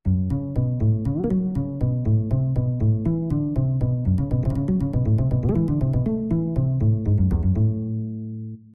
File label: Acoustic Bass Guitar